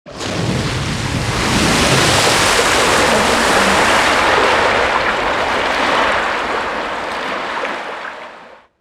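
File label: monster within hydra body water movement; soundmorph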